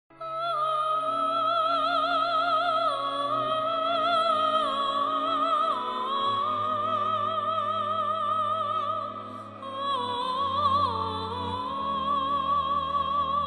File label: Vocalise, soprano